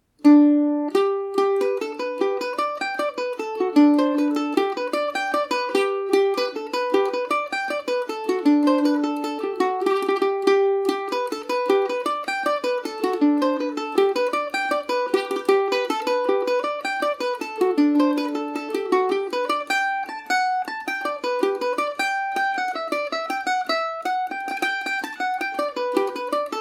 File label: brides favourite gmaj, mandolin